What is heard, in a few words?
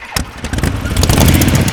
vehicle moto on; mohaa